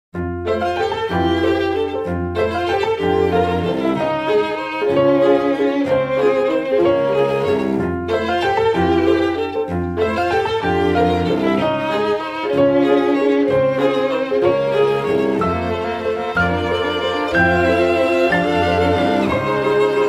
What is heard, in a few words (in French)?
Quatuor à cordes et piano; Le Fabuleux destin d'Amélie Poulain (Bande originale du film)